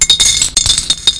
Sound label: money